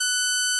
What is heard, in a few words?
Inharmonicity F#6